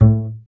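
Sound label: string contrabass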